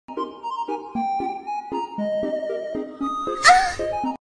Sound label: sound effect